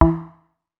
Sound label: quiet knock, message tones